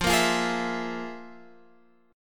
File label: F Minor Major 7th Double Flat 5th, chord; x,x,x,10,10,12,10,13